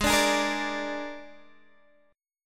G sharp Suspended 2nd Flat 5th G sharp down Guitar Half Step Down, chord; G#sus2b5